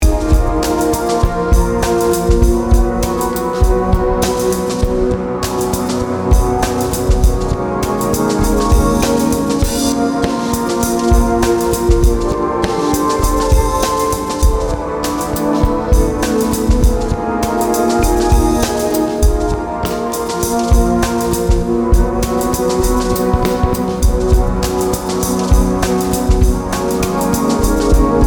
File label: analog pads